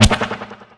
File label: swoosh echo; cdogs; techdemo; funwithguns